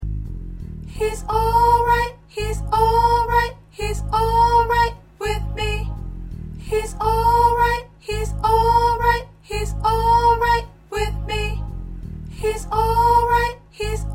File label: Soprano, choir parts